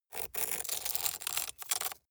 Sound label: scrape stone